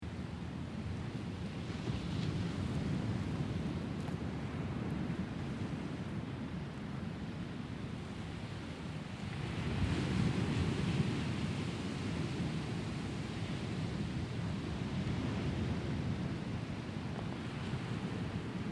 Very Smooth Ocean Surf Loop, Slowly washing, distant waves; very smooth and low intensity, calm ocean waves. Seamlessly loopi